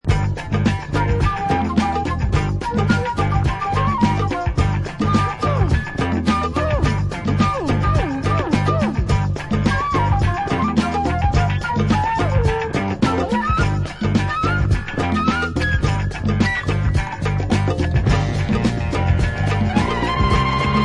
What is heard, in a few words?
jungle jazz